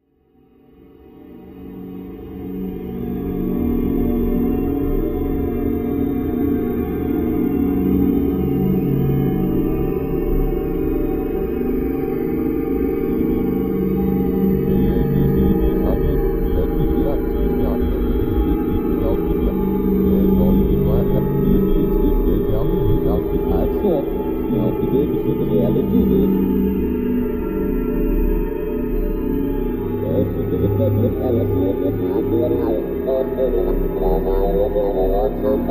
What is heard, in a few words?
Elegy example